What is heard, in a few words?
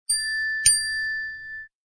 I Phone New Notification